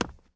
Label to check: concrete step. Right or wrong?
Right